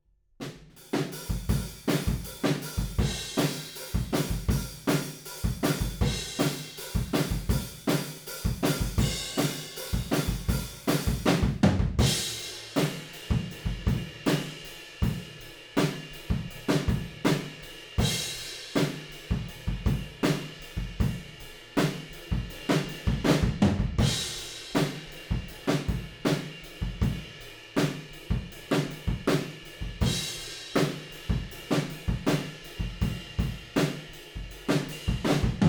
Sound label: Room, 160 BPM